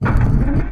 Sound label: Platform Rising